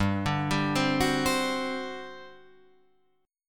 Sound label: G Major 7th Suspended 4th, G, down, Guitar, Drop D